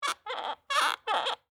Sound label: Wood Creak Squeak, Old, Handle; Foley; Squeak Sound Effects; Squeaks (Sound Effects:Objects); BlastWave